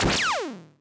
standard shot low

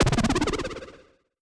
thought bubble cloud

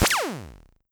standard shot

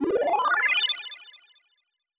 synthesizer ascend medium short

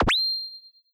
exclamation mark high

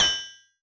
synthesizer metal no reverb

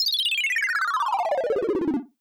synthesizer descend long

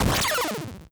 confuse

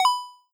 coin get low

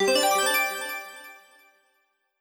accent brilliant low slow

